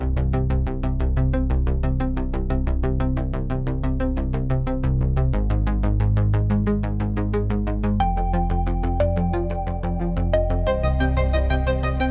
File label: Instrumental